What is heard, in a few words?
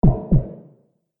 Heartbeat One Shot Robot; Twistedtracks